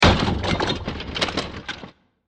Massive Impact With Various Wood And Metal Particles; Bursts; CraftedSignals